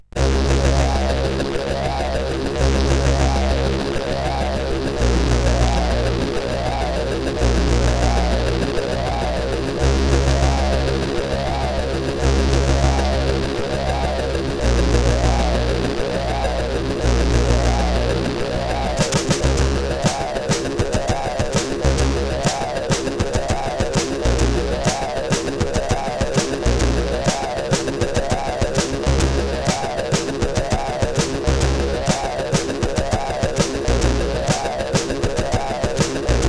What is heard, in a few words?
Heloport, CSG8580R5; sid